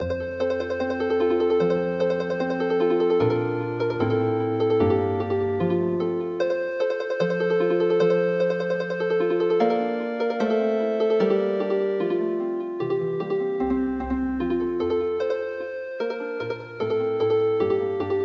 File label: Healing Harp, traditional; midi